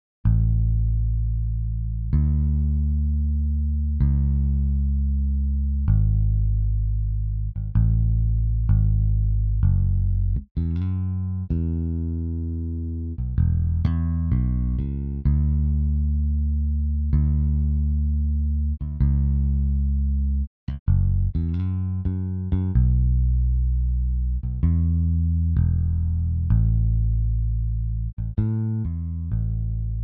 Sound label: Bass; Beethoven; プロの音プロの技・令和版